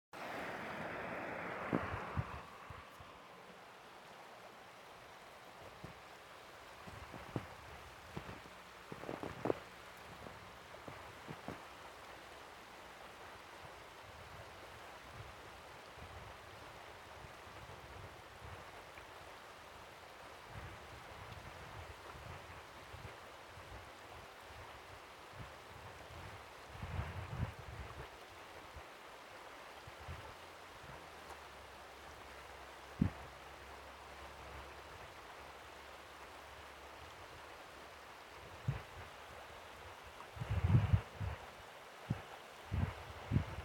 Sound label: falls sledding hill; berea